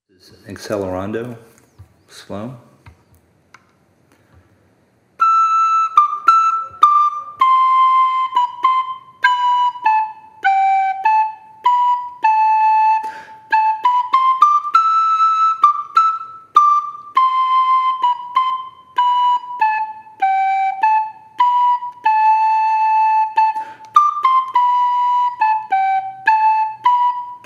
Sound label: Accelerondo Slow